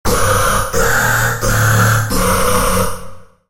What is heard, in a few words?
Lead - Womp; Routed Sound; Vital Expansion - Chaos Bringer Vol.1